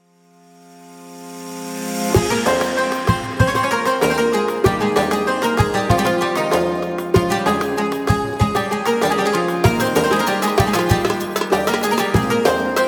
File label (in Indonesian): musik